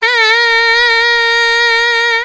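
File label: mosquito buzzing; kunchong jiaosheng; wenzi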